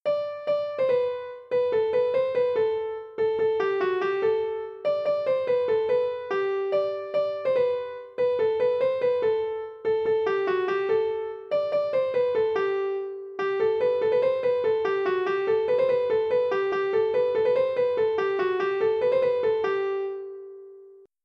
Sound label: polka; boeuf trad